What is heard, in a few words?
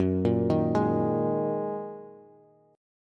Gb 6th Add 9th Gb down Bass; arpeggiated; Gb6add9; JGuitar